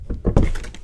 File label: chest close; Untitled Maze Game